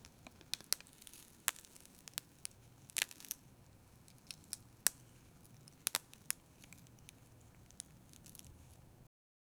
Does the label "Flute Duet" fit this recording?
No